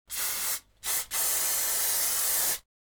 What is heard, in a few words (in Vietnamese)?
Tiếng bình xịt muỗi kêu khi phun